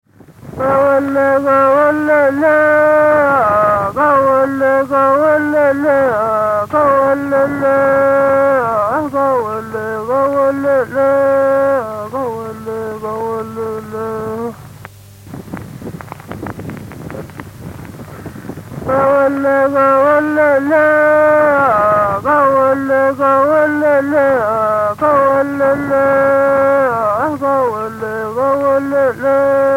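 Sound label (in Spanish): Canto de mujer, Mapuche; La Buitrera Neuquén Argentina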